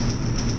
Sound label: Desert Gate Loop; Zelda; OOT